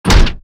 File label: Robotic Footstep; SoundsCrate